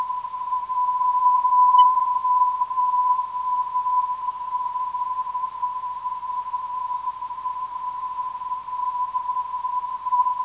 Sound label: 312.5kHz, NDB; KML